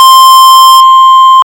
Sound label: alerts